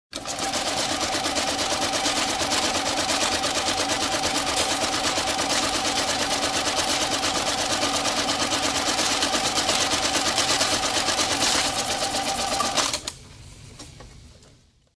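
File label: schet deneg mashynkoi, counting money with a machine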